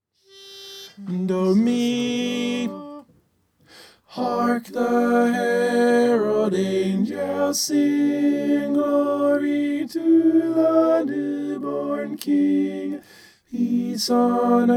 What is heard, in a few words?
hark the herald tenor